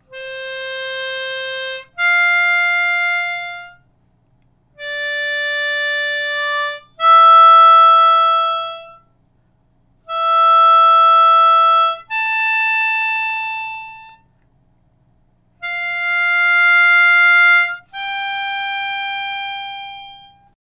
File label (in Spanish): Ejercicios Notas Simples con movimiento y cambio de aire, armonica